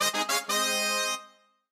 SFX Fanfare; pokemon battle trozei pokemon link battle 2014 nintendo 3ds

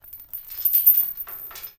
CHAIN Handle mono

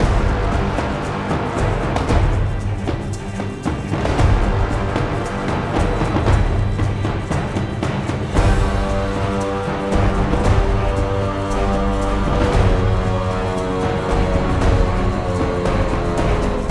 Boss Fight Theme Loop

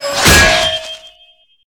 Metal Squeal And Hit

